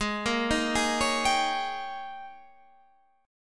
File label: Ab Major 7th Suspended 2nd Suspended 4th Ab